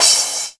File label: short reverb crash single hit e key; Cymbal; drum sample